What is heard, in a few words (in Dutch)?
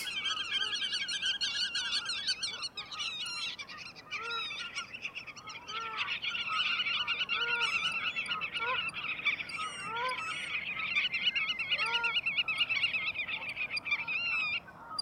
Grutto; lente